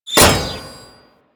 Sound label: Kunai Impact Default; Warframe; Hit Effects